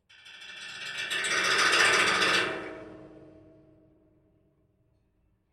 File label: ROLL SOFT TO LOUD with RING SAFETY FENCE